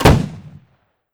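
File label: smg1 grenade launcher; weapons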